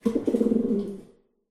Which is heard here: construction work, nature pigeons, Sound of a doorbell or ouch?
nature pigeons